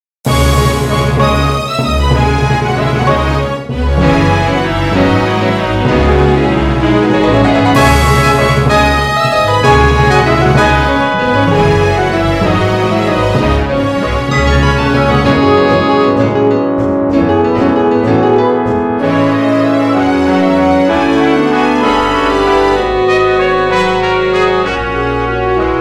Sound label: orche